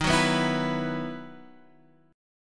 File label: E Major 7th Suspended 2nd